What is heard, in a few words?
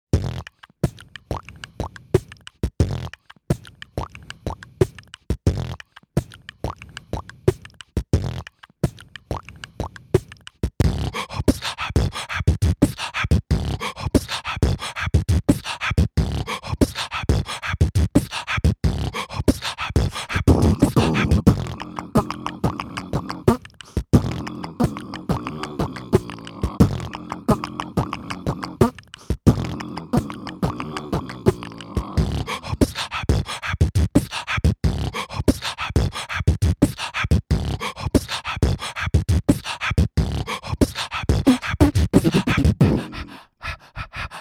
Beat Box